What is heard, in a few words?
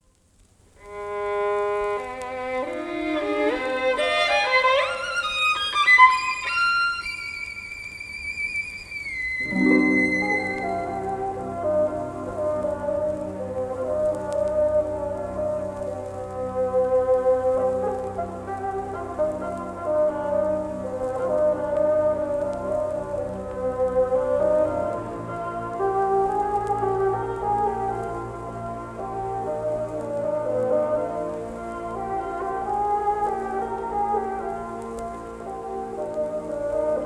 Solo, The Orchestral Bassoon; Scheherazade; Philadelphia; Ormandy; scheherazade mvt 2